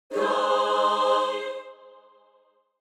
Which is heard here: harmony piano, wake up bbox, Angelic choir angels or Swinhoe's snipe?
Angelic choir angels